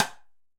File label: Timb B H Stick, Latin Percussion, Drum Hits, Timbales; Drums; Ableton; Factory Packs